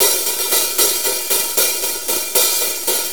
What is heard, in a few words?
6-8 HiHats, HiHat Ride Loops; Drum Tools